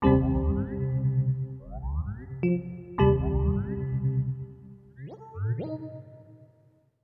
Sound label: SYNTH D+B 160 C; eJay; Keys